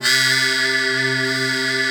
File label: PAD C2; Best Service Dream Experience; JS PAD